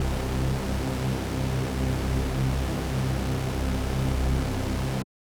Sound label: SuperSaw C3; KG MegaSaur; M8